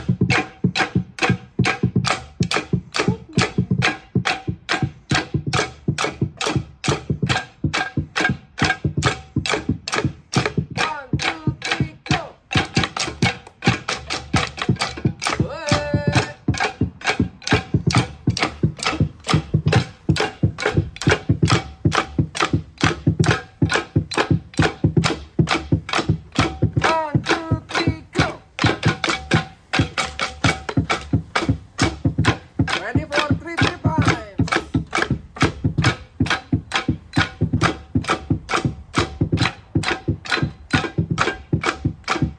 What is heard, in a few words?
roof tile music; lumbung commons; humdrum press